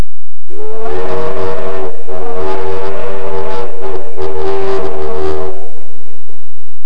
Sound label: steam